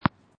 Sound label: Tennis Ball Bouncing on Court Soft; Tennis Sound Effects; Sports